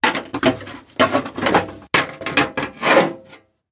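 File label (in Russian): подготовка нужных предметов для ремонта квартиры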